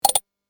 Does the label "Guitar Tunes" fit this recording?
No